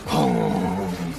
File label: Vibration